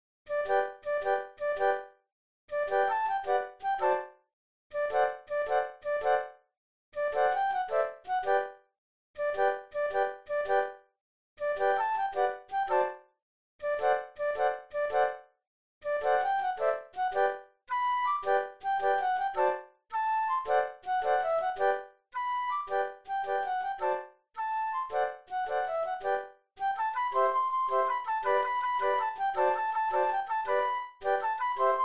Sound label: Flute Quartet